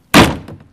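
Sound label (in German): Türschlagen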